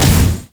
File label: monkeyking spin to win hit big; LoL